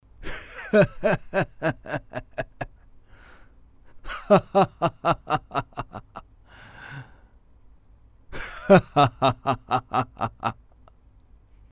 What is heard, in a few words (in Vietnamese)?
Tieng cuoi nhan hau cua cu ong nhieu tuoi